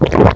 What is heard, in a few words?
swallow